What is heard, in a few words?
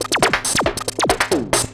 drm 138, Techno, drum-loops